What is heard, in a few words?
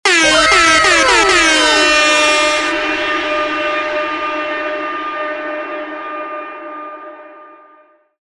airhorn winner